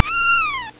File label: mewl